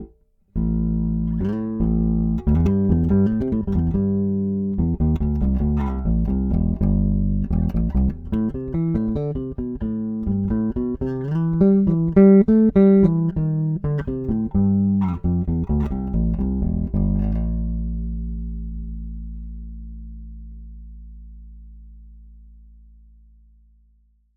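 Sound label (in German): Tc Electronic Afterglow nur Mix Regler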